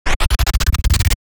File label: Amp Stutter Glitch Vari Fi Speed Up; GlitchBlog